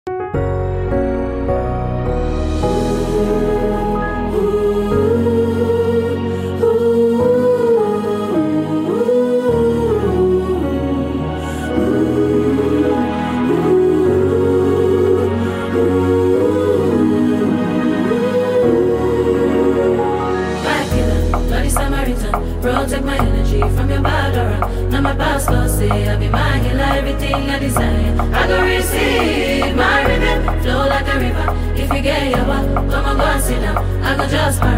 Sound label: Choir